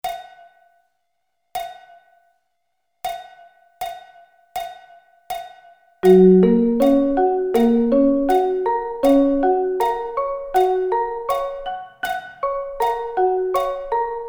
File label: Gb Arpeggio Inversions